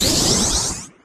discipline device power up; tf

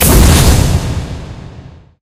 spell fireball cast; halloween; video games; source engine; tf

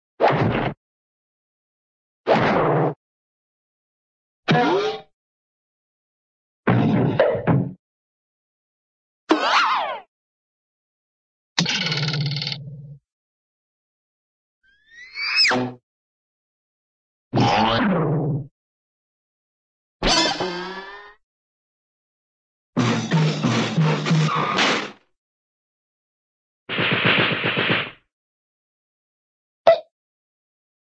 hit, cartoon big swing and heavy hit

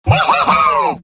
scout cheers; mvm

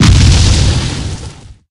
explo medium, boomer explode; player; source engine; left 4 dead 2

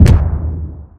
underwater explode, weapons; Source Engine; video game

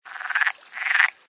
animal call frog; Source Engine; tf; lair

